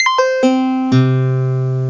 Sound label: Low Guitar; Amiga-CD 1996 #5-6; sampler; Instruments; Amiga Magazin